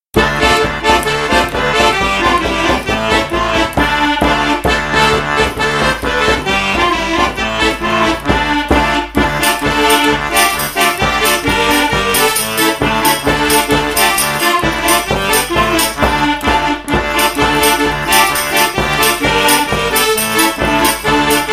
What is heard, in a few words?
Polka; Kowalewskich